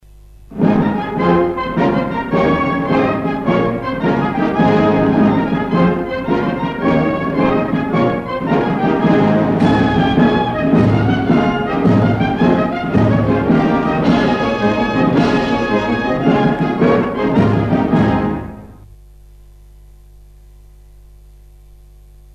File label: Lilliburlero marching band version